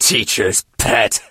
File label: monster hurt vo